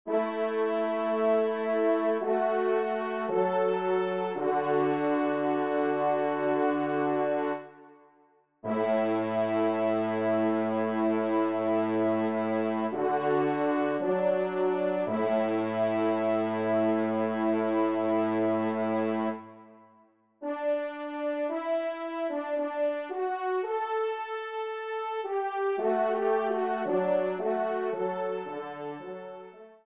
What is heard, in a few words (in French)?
TRP; L'Etang de Saint Bonnet; J Poncet